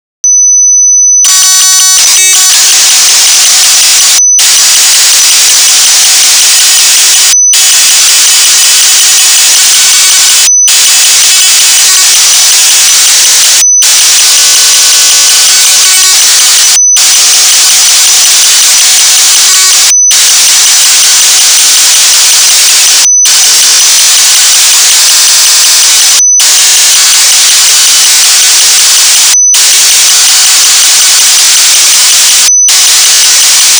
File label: stereo delay